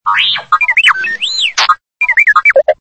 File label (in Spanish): Tienes Un Mensaje Nuevo R2D2